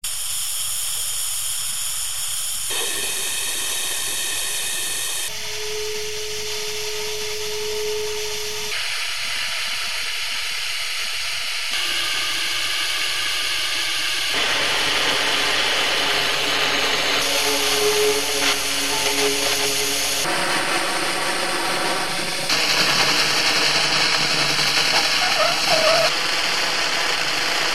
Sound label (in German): rauschen